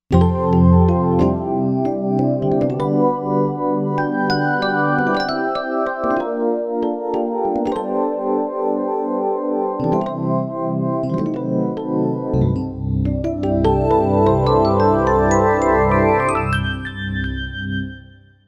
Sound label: Ring EP